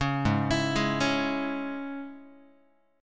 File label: Ab Suspended 4th Sharp 5th, Guitar; arpeggiated; JGuitar